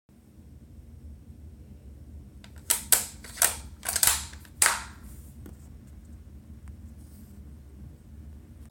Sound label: Sniper Bolt Action